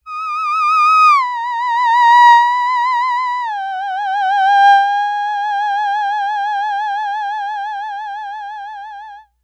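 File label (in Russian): theremin buzz гудение терменвокса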